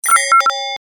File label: connection, notification user interface ui sounds